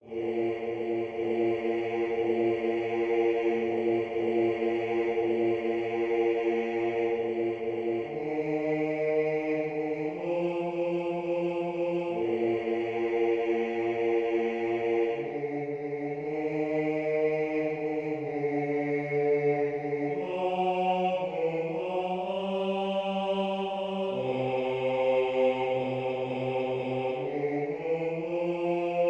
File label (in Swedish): bas; Irish blessing